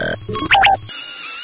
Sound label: mine reprogram; video games; npc; roller; source engine; episodic